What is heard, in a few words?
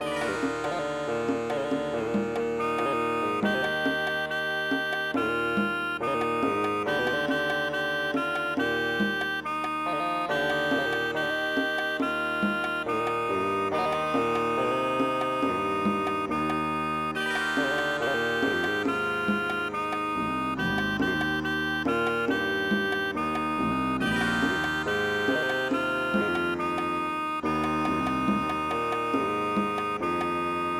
Rajasthan Indian Traditional